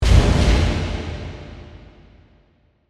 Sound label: Hit Flashback, Reverb